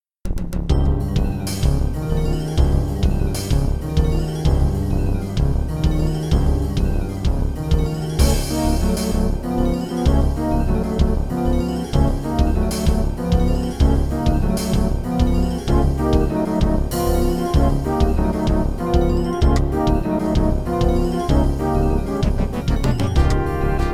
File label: Drone; Fade to Black; DOS; Alcatraz Drone